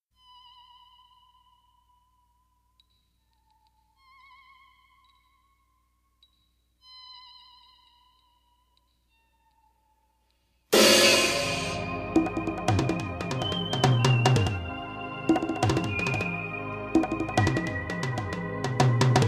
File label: Blues